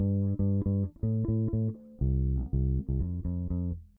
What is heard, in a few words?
bass verse electric